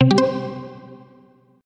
Short soft message

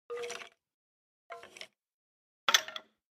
Minecraft Skeleton

